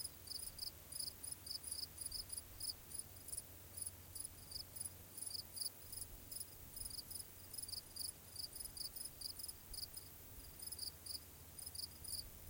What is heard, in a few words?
Crickets; bejeweled 3